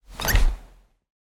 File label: household person sit down on dining chair wooden cushioned recorded underneath